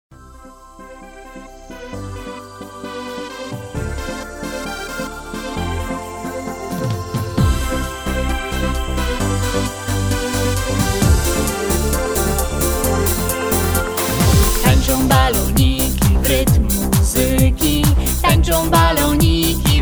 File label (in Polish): wersja wokalna